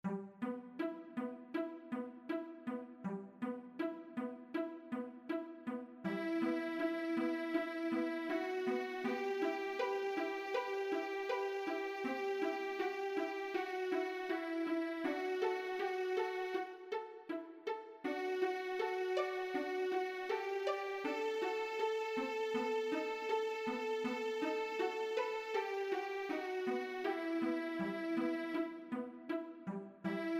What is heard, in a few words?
Rain drop duet